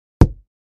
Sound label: Impact Hard, rocks & dirt